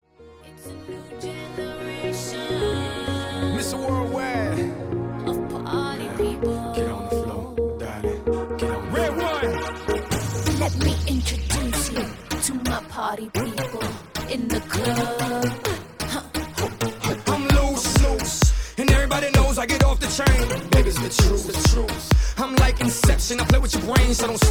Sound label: MUSIC SOLO COMMERCIAL HIP-HOP